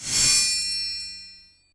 Music

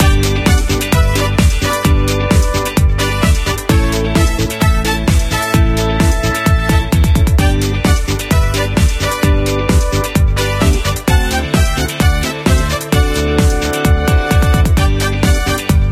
Bonus bgm

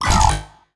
Swimming Collision Stun; MiniGames

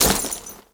Skell Soldier Cloth

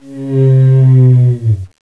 Glyptodont Breathe

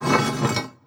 Turrets Metal

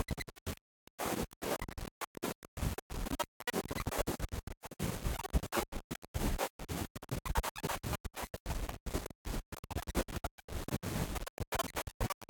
Amb Purple Loop

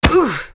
Bogatyr damaged

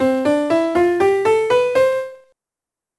Piano Scale; gamerip

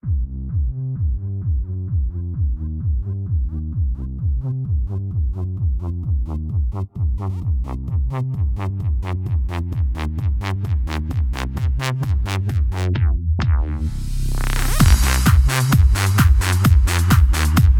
Wobble Wobble; PS4; gamerip; electronic super joy 2

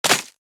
Arrow; Pecheneg

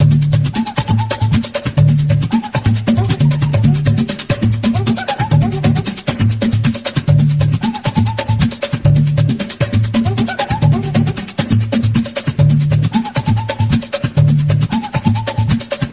Swamp

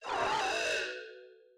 voice Parried

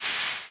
Hero Quake Spell Dash

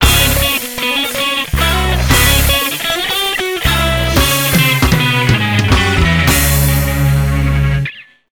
Match Won

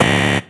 Drone; rabbids multiverse; iOS, macOS, Mobile

